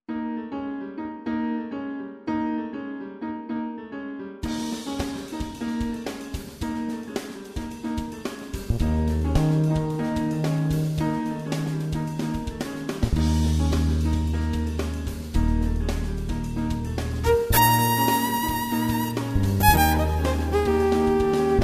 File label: latin joy fade